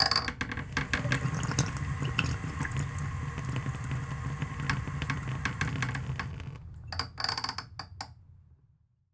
Faucet Bathroom Sink Faucet Turn On Low Shut Off Short; household